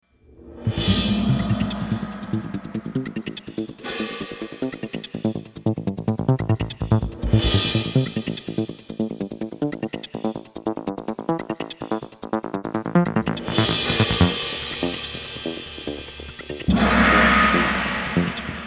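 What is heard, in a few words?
Battle A minor 144 bpm; Disney Infinity 2.0 Marvel Super Heroes; Arachna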